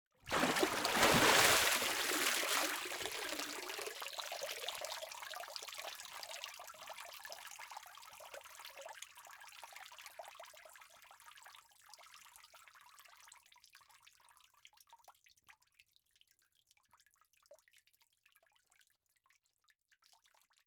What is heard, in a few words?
Liquid Water Water Drip Stand Up Drip